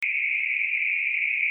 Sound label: bark